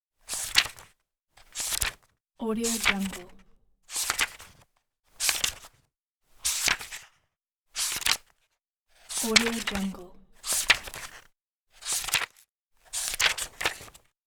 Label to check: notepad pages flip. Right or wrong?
Right